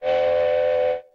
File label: Feedback Angry